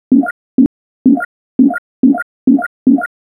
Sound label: Train